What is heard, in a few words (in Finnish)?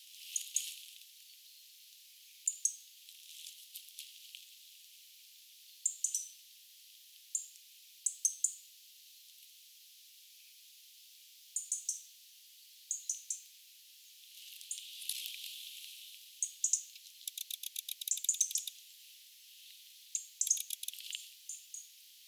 erikoisen kuuloista punarinnan tiksuttelua kuvien lintu